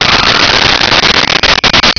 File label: pod slide up